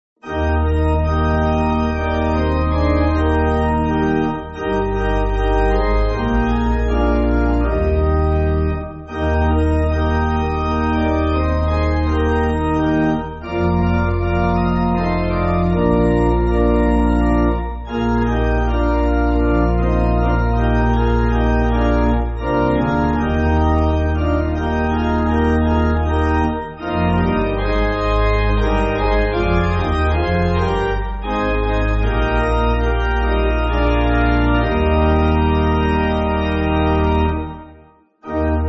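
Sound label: Pipe, Pipe Organ, Backing Files for Churches; Doesnt Jesus Belong; Ramanantsalama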